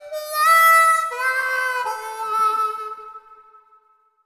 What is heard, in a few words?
Poker Lose; Gun; PS2; PSP; Xbox; Xbox 360